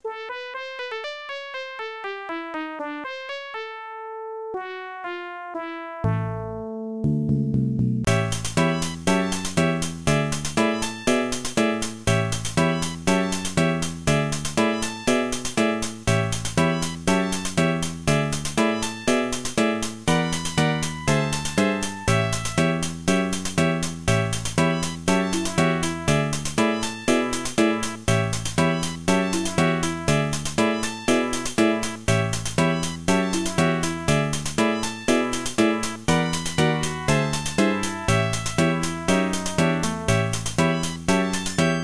Menu; 1000 Miglia

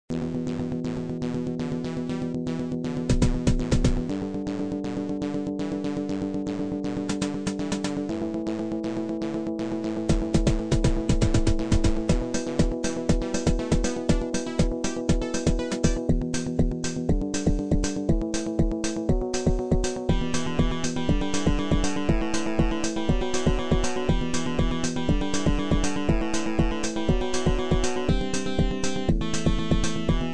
main title music Adlib; Street Rod 2

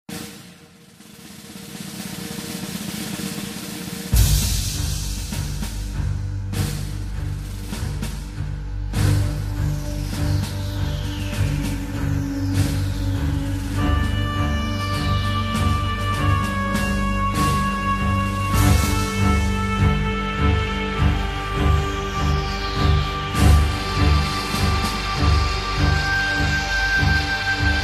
Music Dungeon; Darkstone

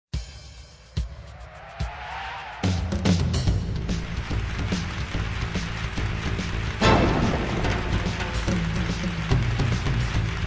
Roland LA music Arena; Dark Sun Shattered Lands

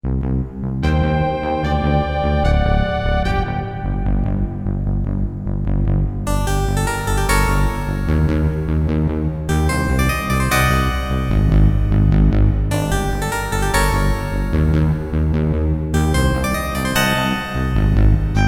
combat

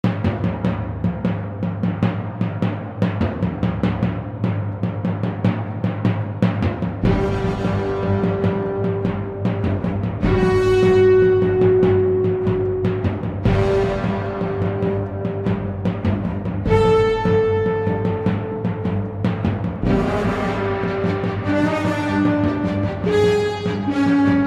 battle; sacrifice

